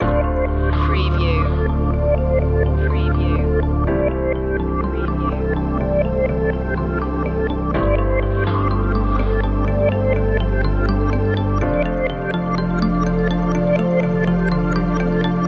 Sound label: future waves loop